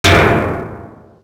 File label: metal hollow hit; projectiles; bullethit; Max Payne